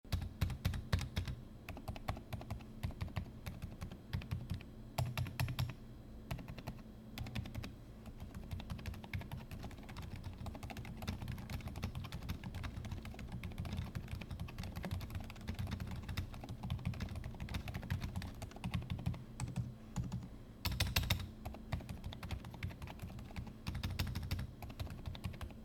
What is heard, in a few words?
gaming keyboard; be quiet dark mount